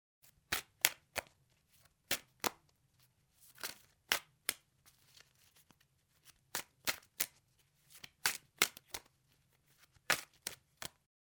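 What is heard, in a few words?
sound of playing cards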